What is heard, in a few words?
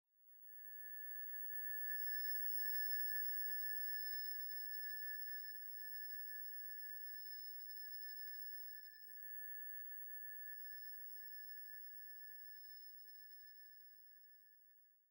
Backgound High Pitch Sound In Explosion